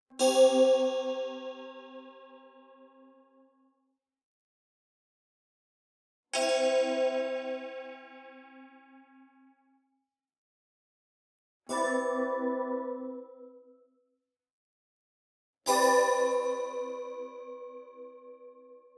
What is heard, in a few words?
Arcade Click Positive